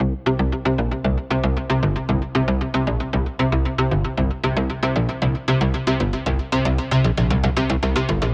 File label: bassline, a plucked synth bass that gradually opens up using some filter automation over time; Rhythm Quest; There's nothing too crazy or special going on with the drums, just a pretty basic pattern